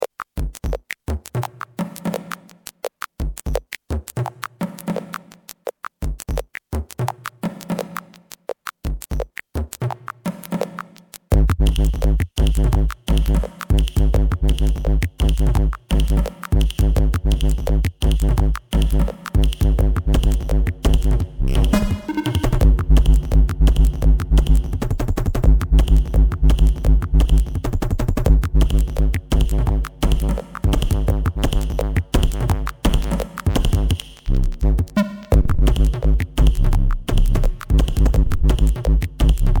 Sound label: dumbnbass